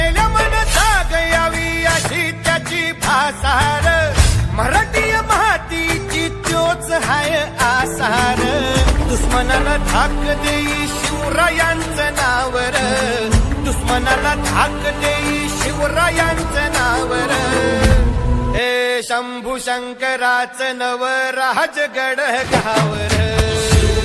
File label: Marathi Ringtone; Chhatrapati Shivaji Maharaj Shivaji Raje